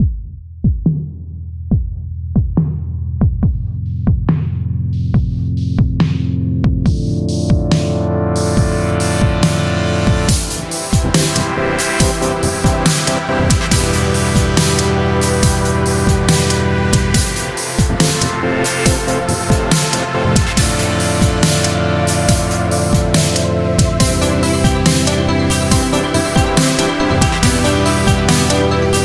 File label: music arped triumphant; overlive zombie survival rpg android game music